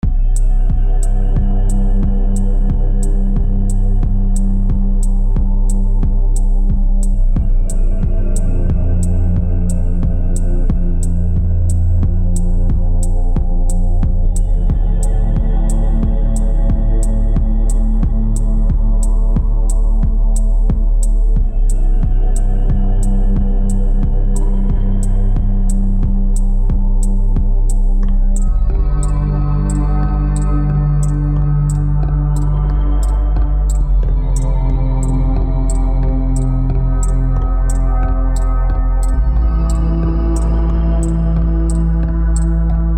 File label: ambient